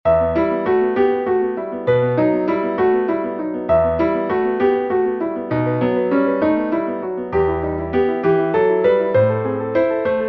Toccata in E minor

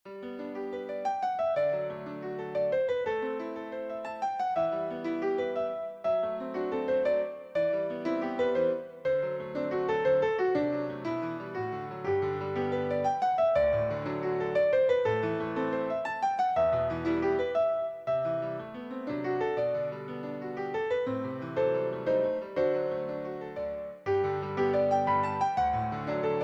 Arpeggio Study in G

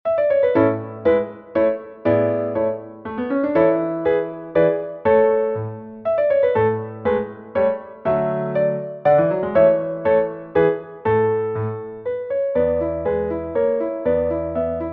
concertino in C major II. Minuet and Trio

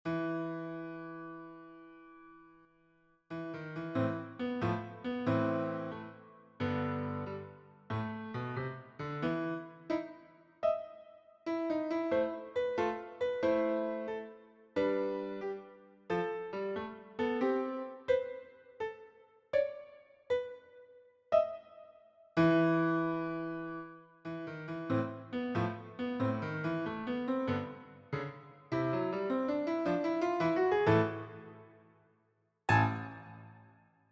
spooky treehouse

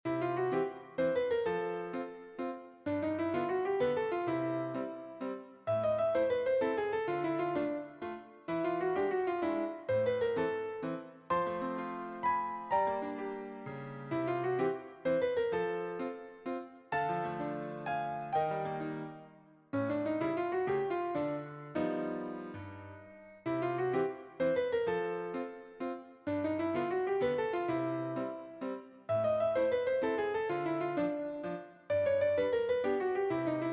Chromatic Waltz Etude in C

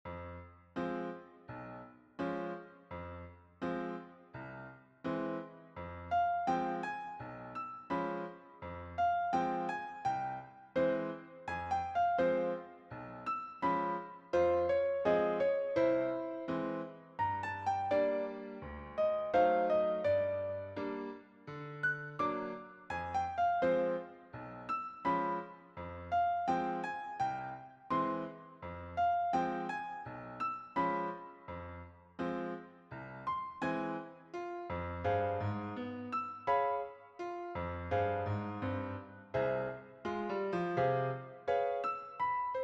solo piano

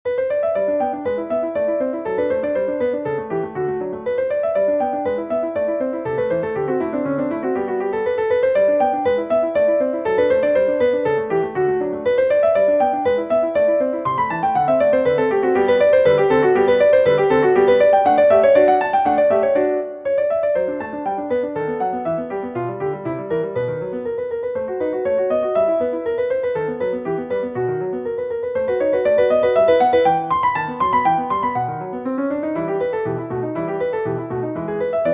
Allegro in G

